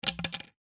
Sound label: pipe pvc plastic drop ground; foley